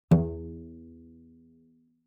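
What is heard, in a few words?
Cello pizz fortissimo sul C E2; MIS Pitches - 2014; Strings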